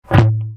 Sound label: thump